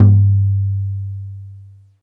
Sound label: Tom Mid SL; Lightning1024